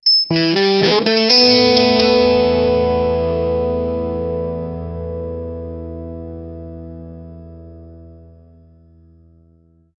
electro guitar cartooney, electric guitar

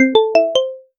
sharp attention grabbing, mobile game

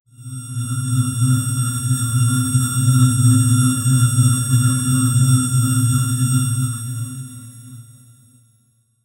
a futuristic electrical, logo

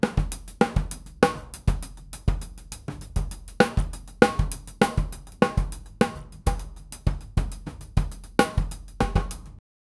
drum loop acoustic

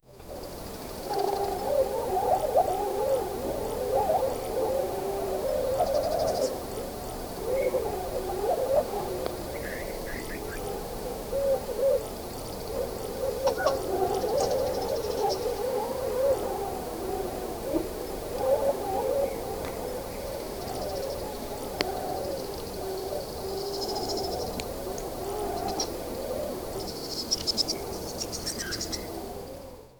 slow fluttering wings of; park

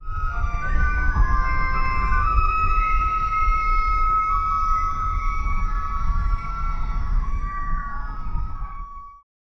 distant carnival ambience

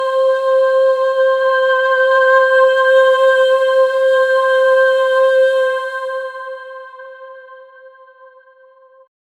female choir ahh c key; vocals

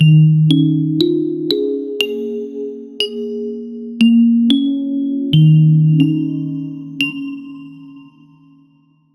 warm bouncing melody with, plucks

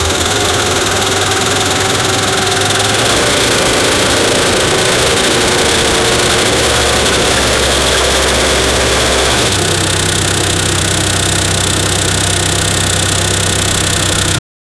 engine firing non stop ma, automotive

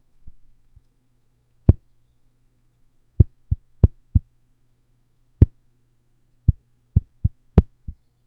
soft jumping sound effect

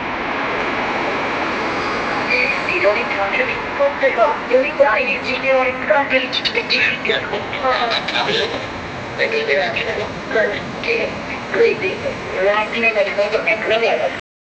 aeroport with voice

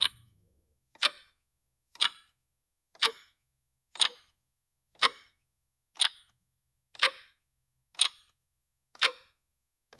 soft ticking clock; time

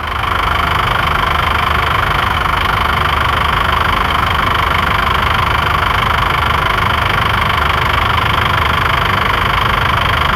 dacia 1310 engine sound; automotive

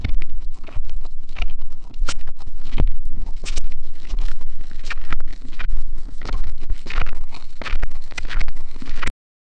robot realistically walki

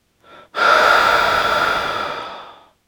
inhale through nose

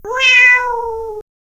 a greeting meow sound, domestic

